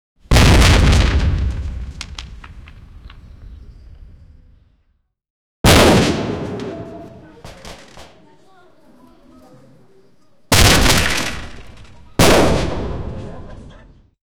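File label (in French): un bâtiment de 12 étage, catastrophe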